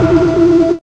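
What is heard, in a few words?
synth tone; E-Lab; X Static Goldmine 1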